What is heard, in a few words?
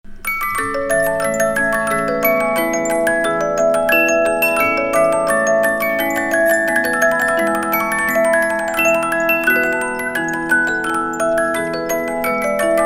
magic minuet turkish 72 note, musicbox